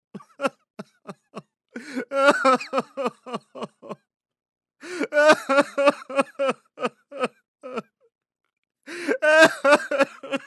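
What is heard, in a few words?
zvuki placha, crying sounds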